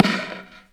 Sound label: Thunder Drum; Sonic Foundry (Sony Creative Software); The Electronic Music Manuscript Collection; Battery 3 Sample Kits